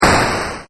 damage; Wreck-It Ralph

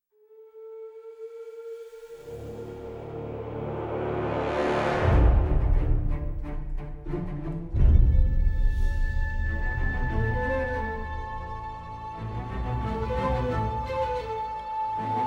music; pirates; at worlds end

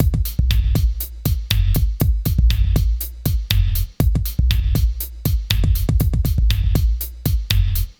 Beat; pooh; merry games; jammin

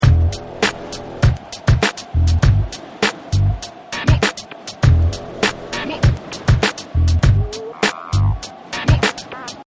game music; holidays; snowbrawl

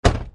chest close

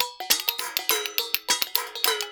Pots n pans; pooh

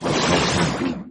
lightning; john carter; lost symbol

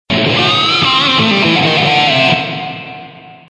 rock riff

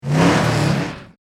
Rev; Darryl Cartrip; Cars

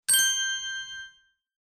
correct ding; think fast

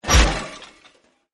big crash; Wreck-It Ralph

